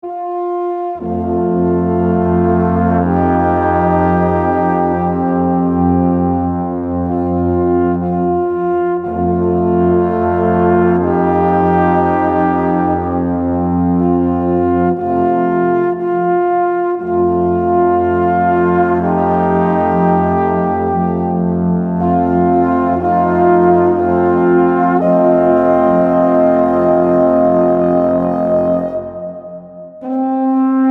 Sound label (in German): ALPHORN IMPRESSIONEN